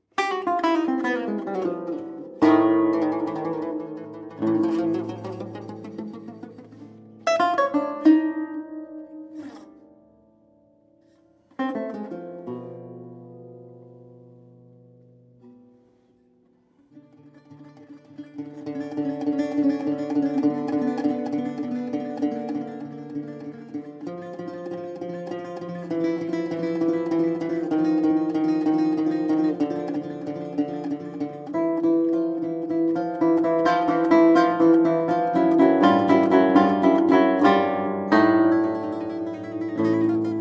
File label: Prelude, lute